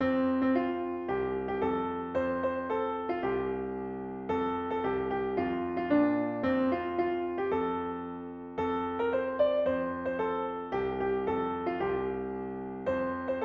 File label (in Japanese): ピアノ